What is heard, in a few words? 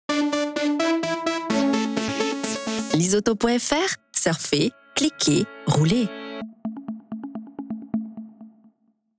JINGLE; LIZAUTO